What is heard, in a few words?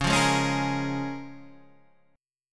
C sharp 6th